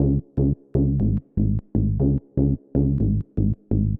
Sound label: SH101 Bass Loop (120BPM), retro house